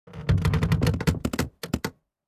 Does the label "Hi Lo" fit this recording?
No